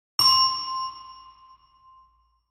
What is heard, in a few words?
Glockenspiel single